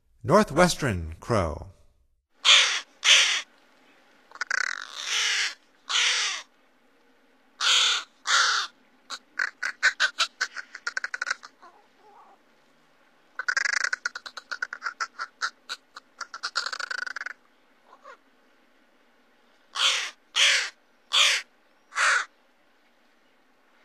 Northwestern Crow, Songs of Birds of North America; Stokes